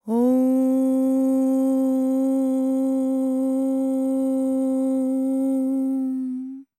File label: World Voices, INDIA; ILIO; Vocal Planet